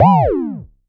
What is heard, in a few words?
Sci fi